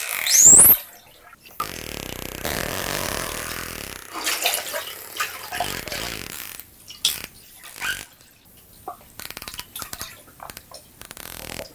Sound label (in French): dauphin sonar